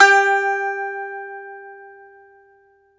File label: BAGLAMA G09L; E-MU Producer Series Vol. 7 – Old World Instruments